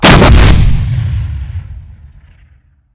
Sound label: 105mm shot